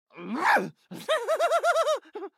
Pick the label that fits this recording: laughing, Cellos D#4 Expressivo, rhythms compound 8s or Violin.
laughing